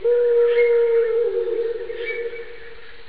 eule

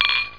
klirr

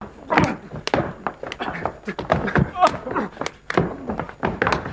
KAMPF; Emergency

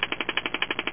Wuerfeln

